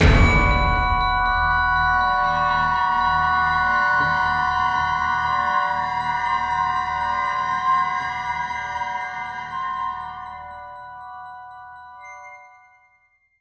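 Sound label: environment rural Road Tile, Runs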